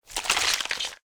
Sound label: medium gore; factorio 2.0.55